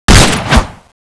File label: gunkata skill